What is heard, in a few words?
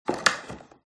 switch vacuum cleaner; fox audio